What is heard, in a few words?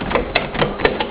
door